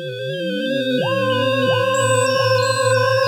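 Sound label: H2O PARTICLE; Pro-Rec - Synthsonic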